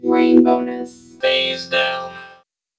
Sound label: Phase Down